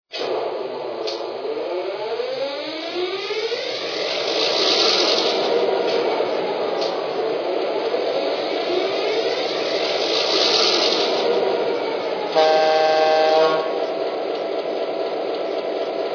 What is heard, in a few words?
e6, trains; FEC